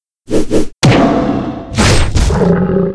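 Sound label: mob raid attack; Monster; 레이드; 백호왕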